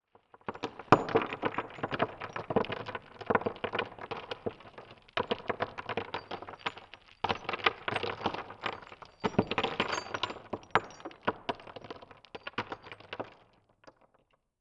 Texture Glass Debris; MatiasMacSD; FEEL TEXTURES VOL 1

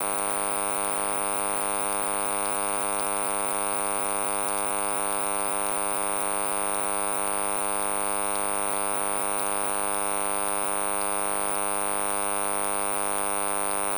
Circuits Electric Hum Buzz Beeps; ShapingWaves

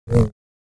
Saber; FXHome

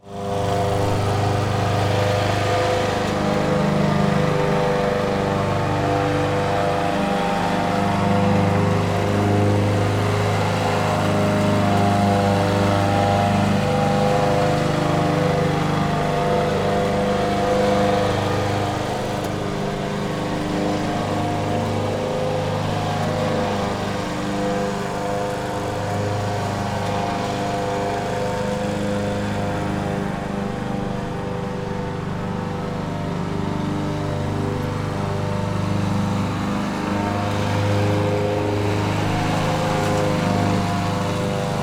lawn mower

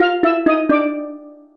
jingles STEEL; Kenney's Sound Pack; Music Jingles

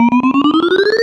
ALARM Alert Ringer Ascend; GameBurp; 2000 Game SFX

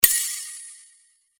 Sword Sound; FXHome; Fighting Sounds

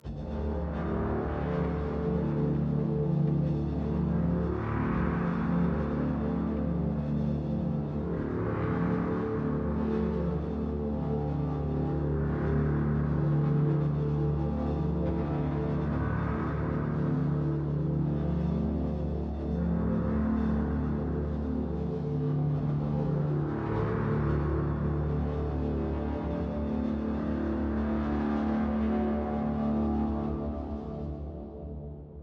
ATMOS, Cinematic Sound Effects; 99Sounds; Generdyn

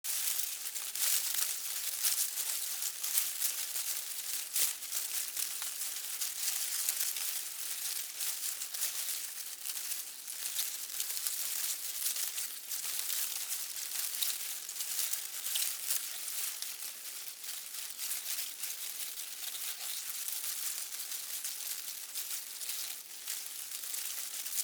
Grass Rustling Heavy; Sonocaine; The Rustle

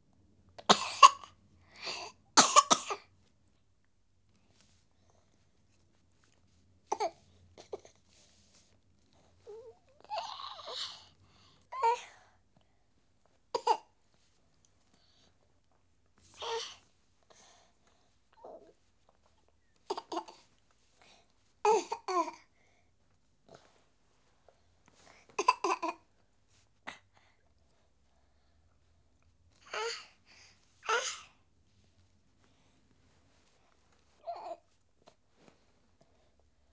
Month Old Girl, Feeling Unwell, Babies; BBC Sound Effects Library

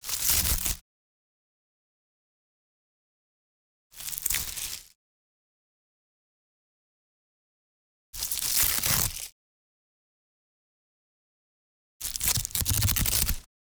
RIPS AND TEARS Craft Paper Rip; Rips and Tears v.2

